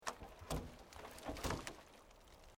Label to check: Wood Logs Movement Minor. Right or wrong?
Right